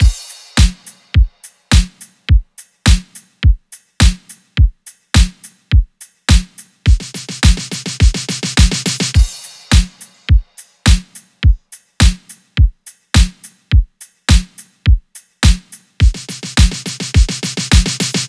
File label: drums 105; Drum Loops; Full Drums; Cherokee; Slate; MIDNIGHT Sample Pack